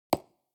construction wood

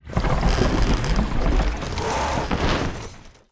alarm fishboat; ships siege

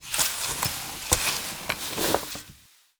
gather field expire

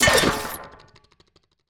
ballista hit ground; ships siege

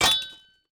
mine metal

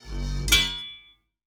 mine metal expire

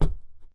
fs wood, singlesteps

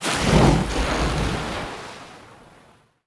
alarm warship; ships siege

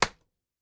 arrow, attack impact

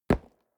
lumber tree

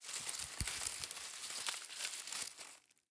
leaves, foraging

